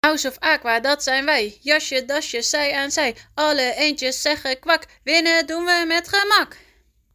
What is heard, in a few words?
Yell; Blauw Aqua